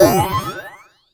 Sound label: FX SWEEP; ELECTRIC-SET